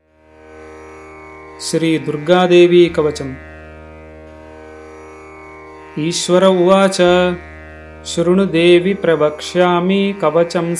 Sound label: sri durga devi kavacham